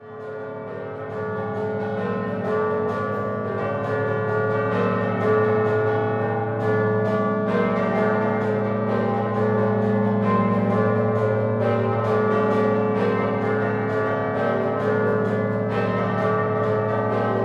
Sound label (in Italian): UDINE DUOMO, campane